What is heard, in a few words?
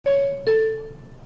bing bong subway nyc